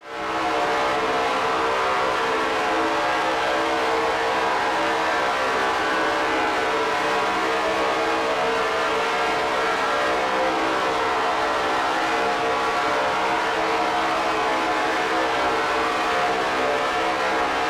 Drone; Get Even

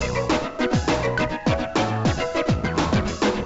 Smash Result; Scud Hammer

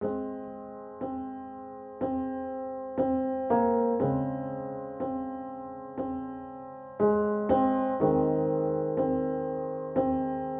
pub; South of the Circle

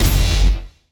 Gauss Cannon Electric Arc

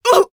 Female Fight Damage; mighty party 2017 gamerip pc ios android

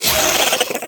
Insects, alerted

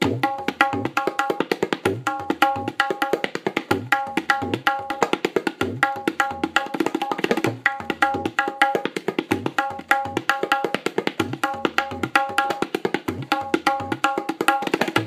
Tabla

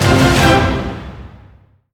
Game Win

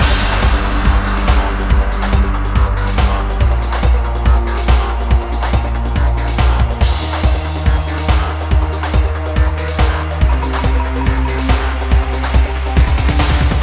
Industrial; Emergency Heroes; wii

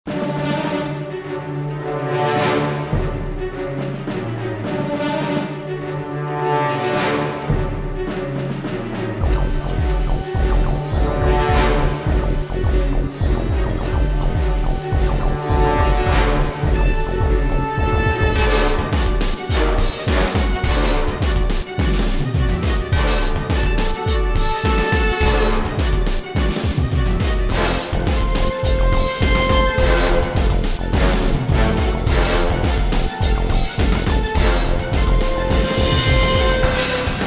Eel Engine Fight; Shark Tale

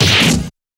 Kung Fu Fight Sounds; Kung Fu Fight Sounds Pack

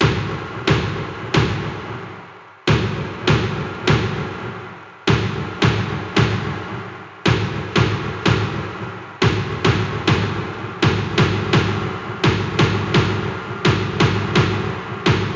Drum; MLB Power Pros 2008

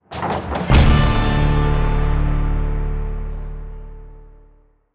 combat stings win; Griftlands

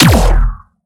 Sciweap Shot Medium Laser Ray Phaser

